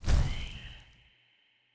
pause menu open

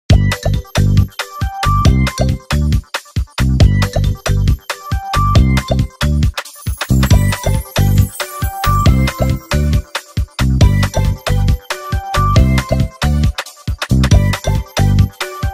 Minigame- Balloon Popping (Slow); Serdin; Grand Chase

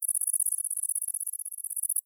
INSECT Crickets

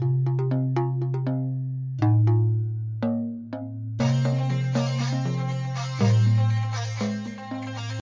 Boss Music Intro; crouching pony hidden dragon

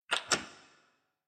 Doors Unlock

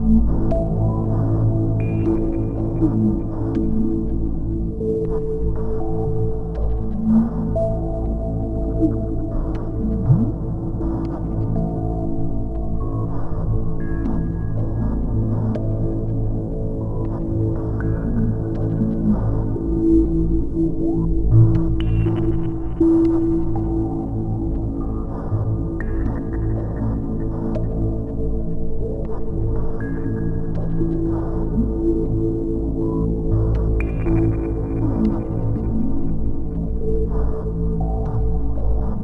pause menu loop 2D stereo; Spider-Man: Edge of Time; Nintendo DS

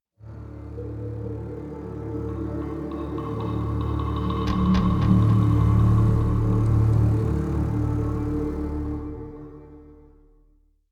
Monster Hunting Intro; The Witcher 3 Wild Hunt; Skellige

